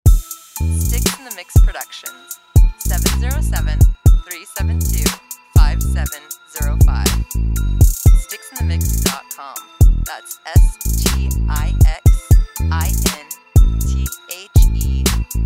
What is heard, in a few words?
J Bells